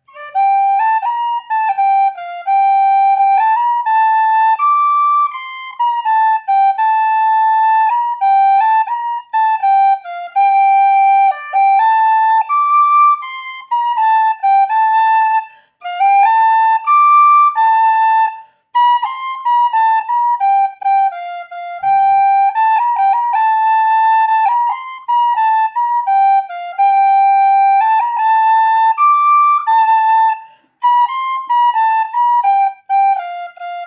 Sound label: C whistle; La Jolie Batelière